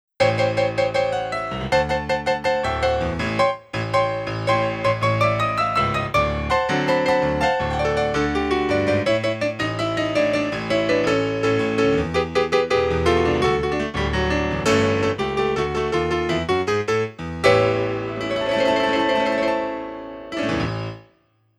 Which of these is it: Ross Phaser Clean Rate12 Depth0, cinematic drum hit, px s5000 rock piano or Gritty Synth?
px s5000 rock piano